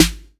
rich top end snare single hit g sharp key; snare drum samples

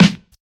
rich low end steel snare drum sound g key

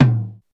tom drum a sharp key

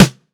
good acoustic snare sample f sharp key

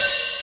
mellow open hi hat sound f key

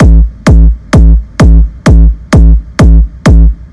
techno sharp kick; drum loops

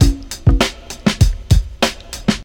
132 bpm drum beat g key

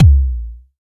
fat kickdrum a sharp key

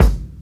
high quality good kick g key

